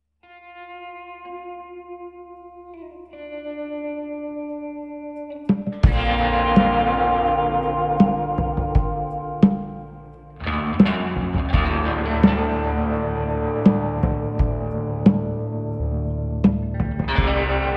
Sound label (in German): Atmosphaerisch schoen